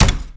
wall wood; Golden Crayon Sounds

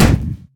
balloon fall; Golden Crayon Sounds

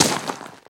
flint land; Golden Crayon Sounds

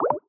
Video Msg Stop; Skype Sounds

